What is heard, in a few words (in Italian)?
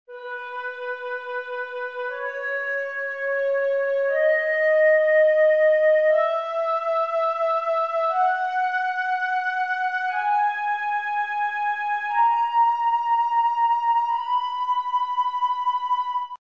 do bem maggiore, scala